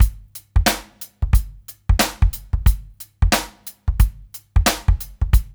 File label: DRUMS, Loops; East West; Funky Ass Loops